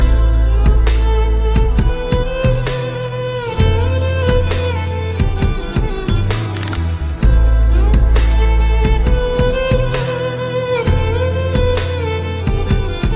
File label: instrumental